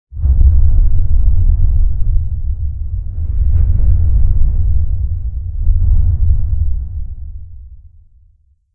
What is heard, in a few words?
random rhumble